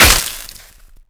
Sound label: plasma impact; weapons